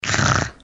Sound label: throat